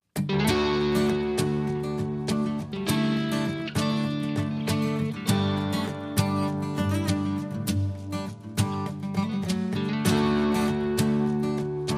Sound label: Acoustic song; Cue Palettes